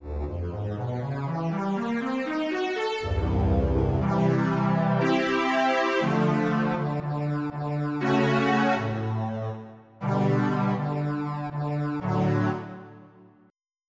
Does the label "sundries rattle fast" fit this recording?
No